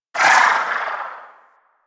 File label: Sapient Pistol Tail; Warframe